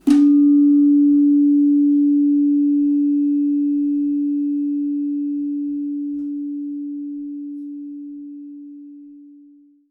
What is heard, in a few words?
slanthem; Saron